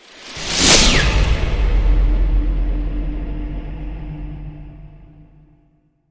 Hit Shred Ray Gun

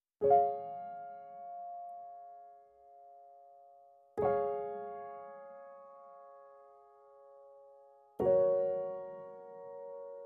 Piano

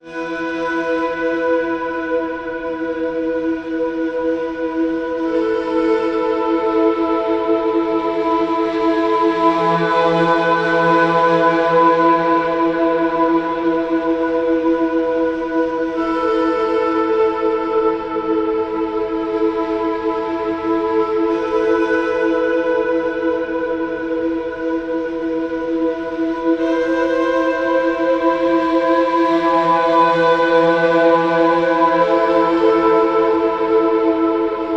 Enigmatic Flutes Pad

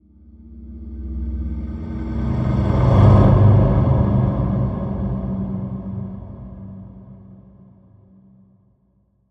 Quantum Ghostly Transition

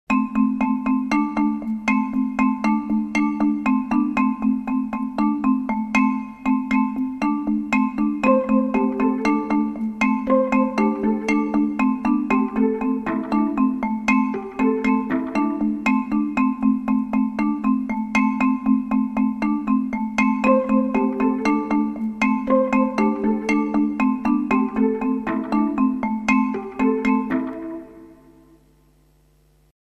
Marimba

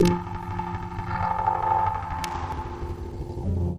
Gentle Hissy Static Transition

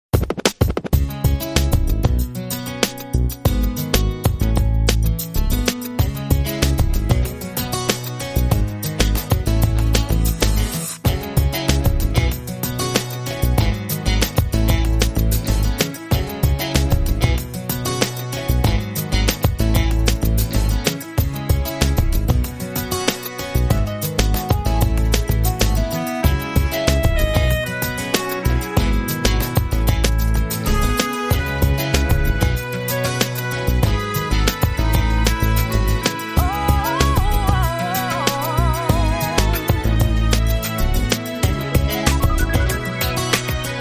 Shuffle Beat Tune

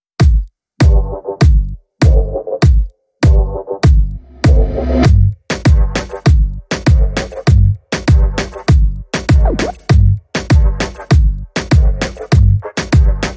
No Percussion

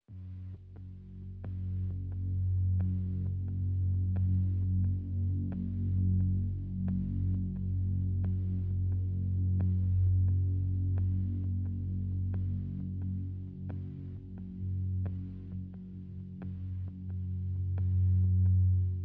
Synths Only

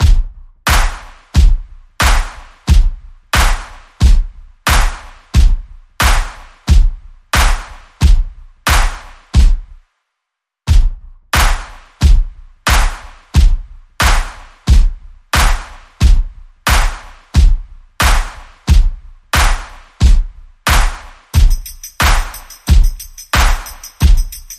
Percussion

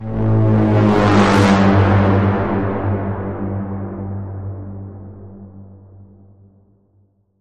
Bend

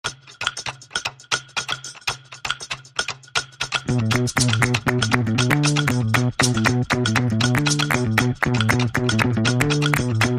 Confetti Cannon, No Melody

